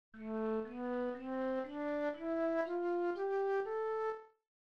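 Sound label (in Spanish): escala la menor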